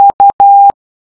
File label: Morse U